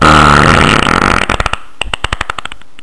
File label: fart